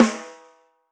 warm snare single hit a sharp key

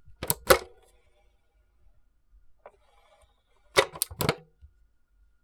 cd player buttons close-open

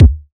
dry kickdrum sample d sharp key

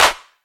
studio clap one shot b key

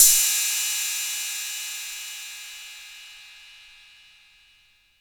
crash cymbal drum sound d sharp key; drum sample

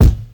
good steel kick drum g sharp key

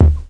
natural kick d key

